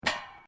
Footsteps Boots Grill Metal Step; Footstep Foley; Human

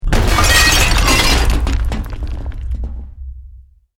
EXPLOSION MEDIUM BRICK WOOD GLASS NO FIRE; Created explosion effects, house, building or vehicle effects; Bomb

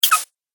Cartoon Pumping Sound

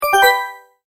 multimedia tone, Goodbye

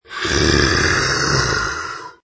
Monster Animal Groan Short

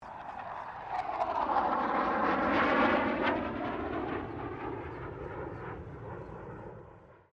AIRCRAFT CA-27 SABRE PASS STEREO; CA-27/F-86 Sabre; Airplane; Airplanes; Vehicles

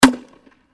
Grenade Launcher 40mm M79 Single Shot; Close Perspective; Warfare; Blastwave

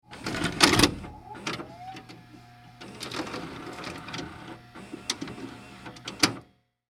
Vcr Insert Tape; Technology; Tape Players; Electronics; SFX Bible

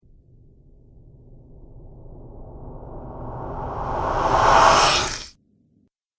Zombie Monster Ghastly Breath; Zomby Sound Effects

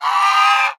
Bird Goose Screech; Geese; Animal

